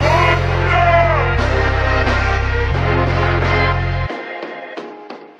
guitar and voice, Phonky Loops

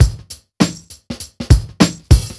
springy, drum loops, funky

